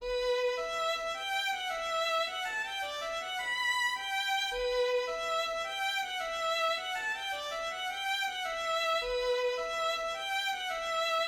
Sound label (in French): rondeau du xx siecle violon 2